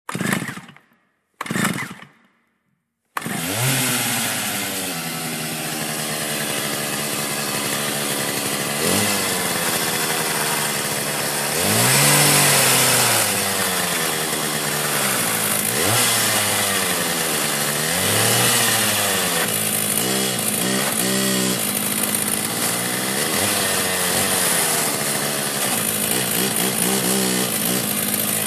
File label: chainsaw ringtone